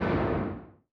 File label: distance mass driver hit, weapons; Stellaris